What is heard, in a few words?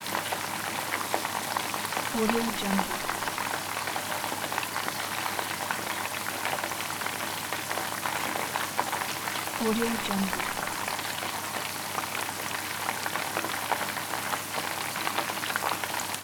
meat in frying pan; foleymaker